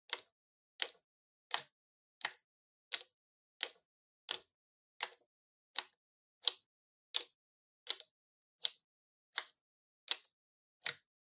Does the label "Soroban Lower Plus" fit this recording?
Yes